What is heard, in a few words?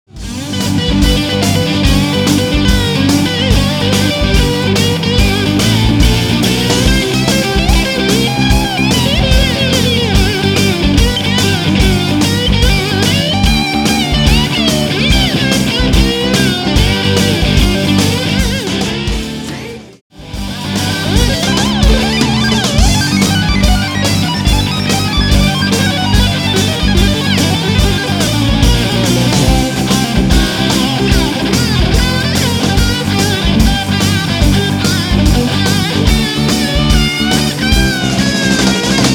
solo